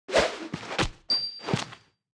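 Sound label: Anim Baby Barbarian, 动作音效; 野蛮人; 绿野世界; 部落冲突; 皇室战争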